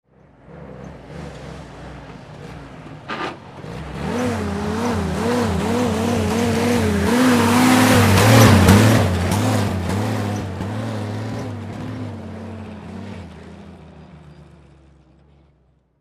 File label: Army Jeep Erratic Rev